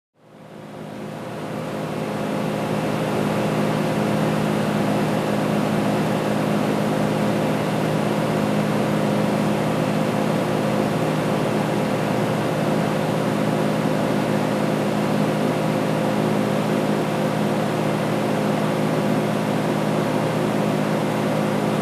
Engine Turbine noise up-close

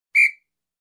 Traffic Officer Whistle Blowing Short

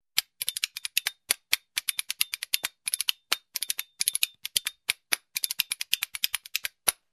Western spoons percussion groove

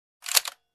Gun magazine rattle

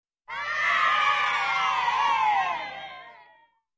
Kids cheering

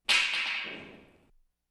Stick Wood drop

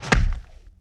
Swipe Punch on a wooden dummy

